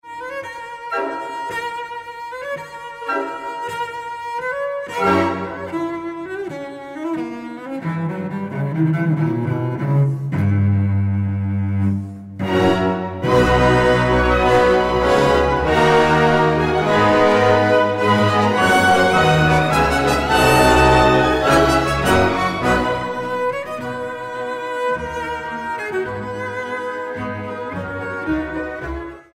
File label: Cello Con; Dvořák Cello Concerto